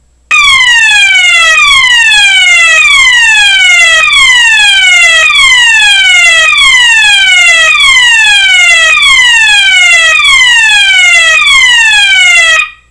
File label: Swept Frequency